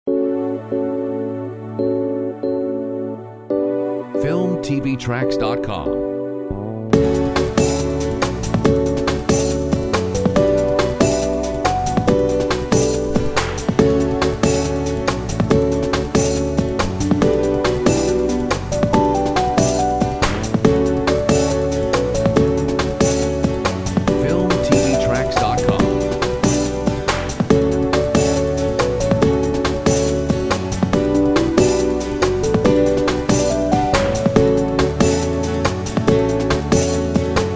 Sweet summer gtrs only, Uplifting Inspiring Royalty free music; Corporate, POP; Filmtv-tracks